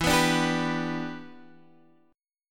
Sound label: E Minor 7th